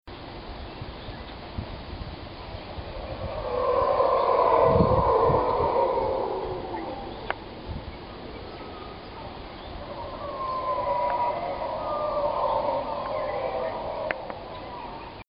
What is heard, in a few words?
Monkeys; panama